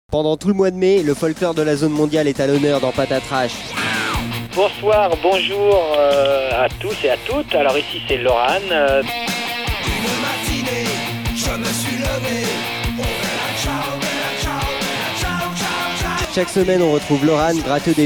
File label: jingle; Folklore de la Zone Mondiale